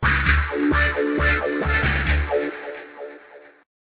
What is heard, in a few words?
ringer; Ringtones